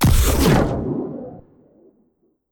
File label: Hellbringer Hit; Legend of Keepers (gamerip) (2019)